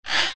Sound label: nono; c-evo